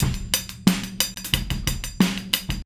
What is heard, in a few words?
Process Beat, Hip-Hop Beats